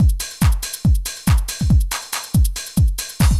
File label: techno 140